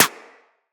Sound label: Bullet Impact Generic